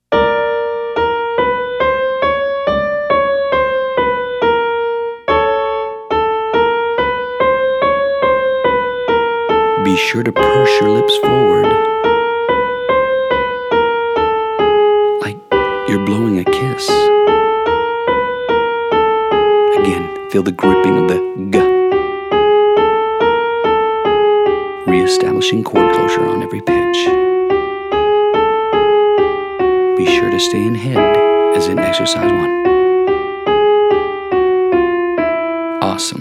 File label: Your Turn female